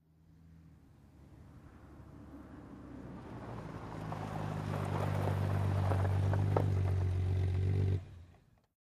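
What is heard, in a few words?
Bmw 530i, In Medium Speed, Stop close up, Off.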